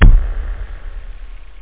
BASS DRUM

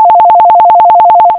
Ring; PhoneWatcher 1.6.1

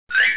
pick Up

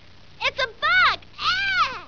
eww; skuld - starmage

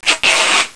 scratch; MOBICLIC; langues

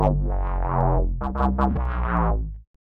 SNTHBASS D+B 160 C, Drum Bass, Bass; eJay

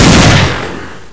desert eagle fire; Soldat

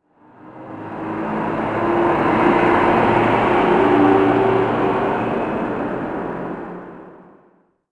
city, zones; Zoom3City

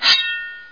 unsheath; Siege+THF; weapons

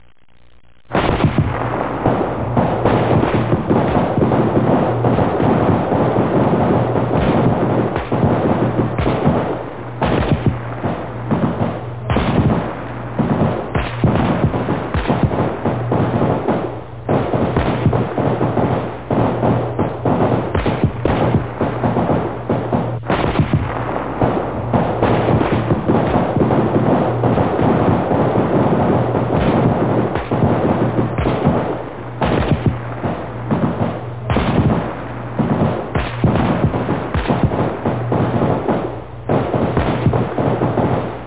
battle

